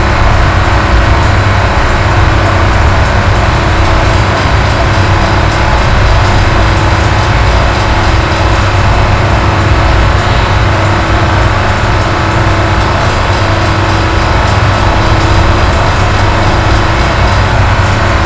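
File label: mechanical machine running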